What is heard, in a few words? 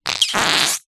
cartoon fart